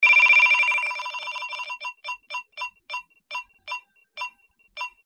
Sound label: Turntable Common, mahjong